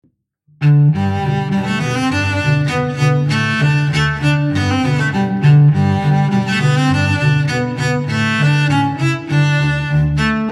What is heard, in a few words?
with bass bar, violins